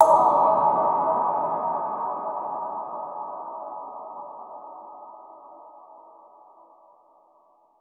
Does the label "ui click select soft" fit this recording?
Yes